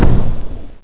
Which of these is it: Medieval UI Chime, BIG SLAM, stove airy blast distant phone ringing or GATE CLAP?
BIG SLAM